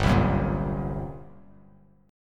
G Minor Major 7th Double Flat 5th, G, Bass Standard; strummed; JGuitar